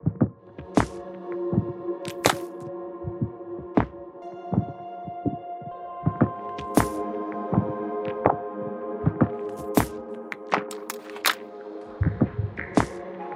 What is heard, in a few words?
Soundscapes Desert Percussion; Splice